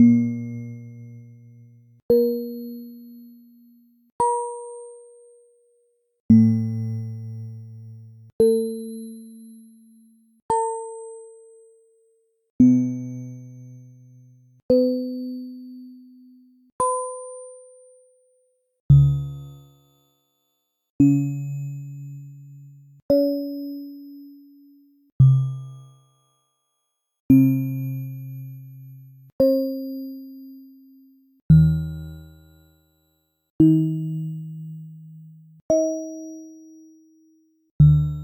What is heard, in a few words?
horn, instruments; fischinger